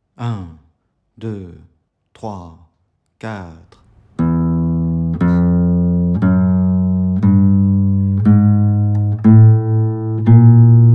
exercice 060bpm

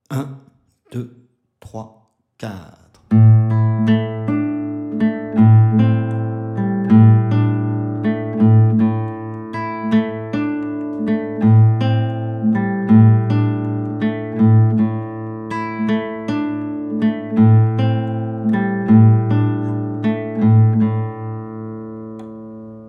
exercice 080bpm

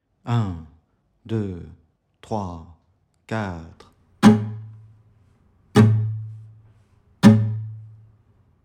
exercice 060bpm 1 mesure

